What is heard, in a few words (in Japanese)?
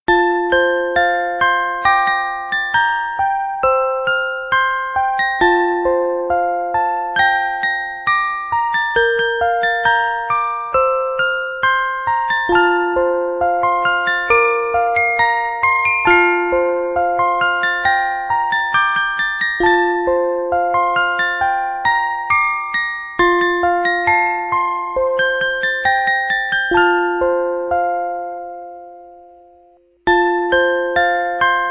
園歌オルゴール